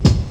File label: SP1200 KIC, Kix Snares; Hip Hop; E-MU Formula 4000 Series Vol. 1; Hip Hop Nation